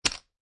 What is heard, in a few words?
Poker Chips Stacking